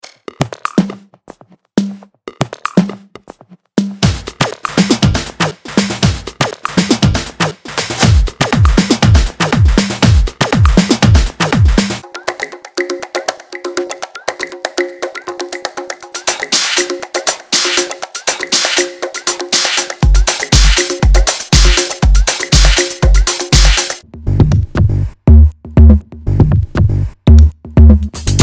Kick Free Percussion; Mutekki

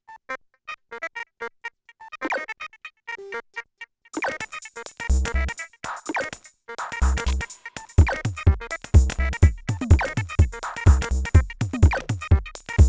Korg drumlogue Glitch Machine

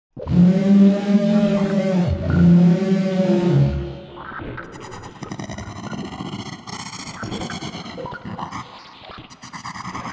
groaning creatures

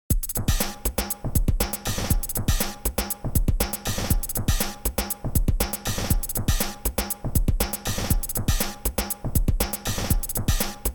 Drums

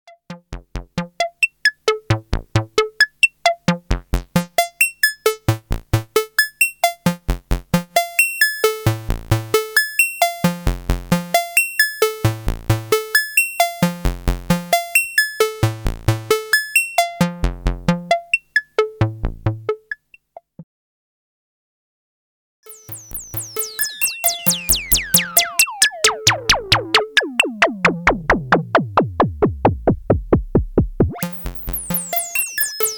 EMS Logik

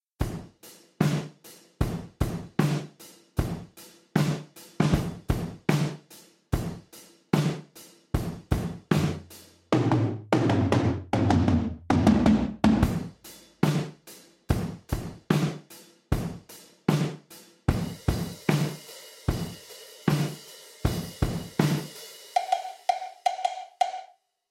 chrome kit gated

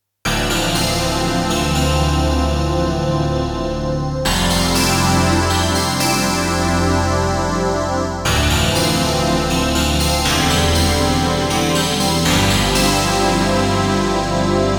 Staccato; StaccatoHeaven; Arturia DX7V

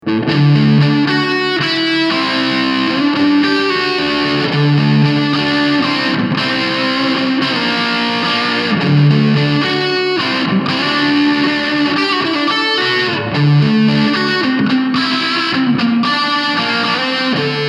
Mooer Hornet 30 Reverb; Preset PL-100

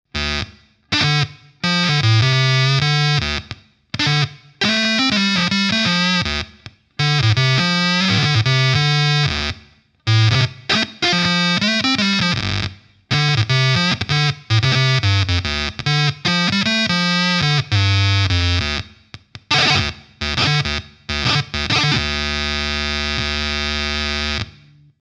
synth; MOD Devices MOD Duo

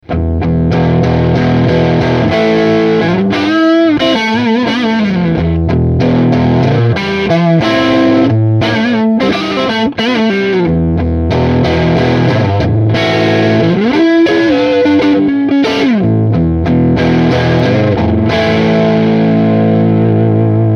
Marshall PLEXI

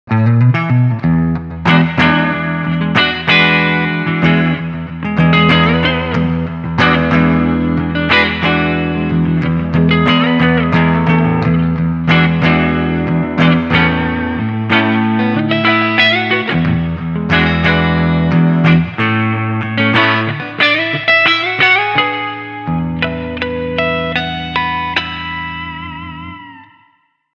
Clean; ISF USA

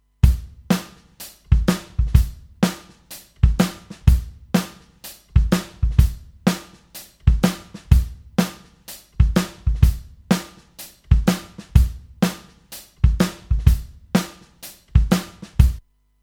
Drums Linear Tilting Left Solid State; Gyraf G23 S

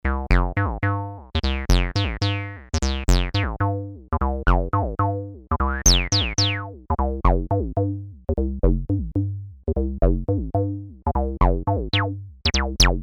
TD-3 SubOsc only

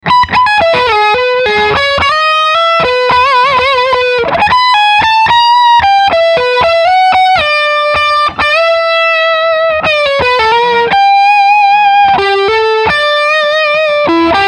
High Gain Lead Line

